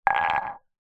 household glass coffee cup thick empty set down tiled floor; zapsplat